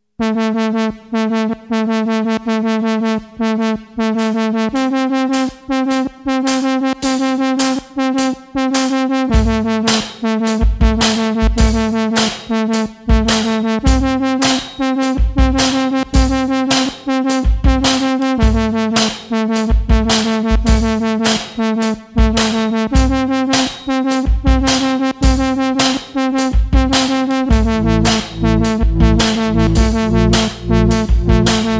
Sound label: Dark Drone HIP HOP